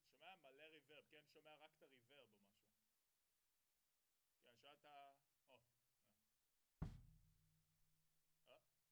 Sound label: SNR Top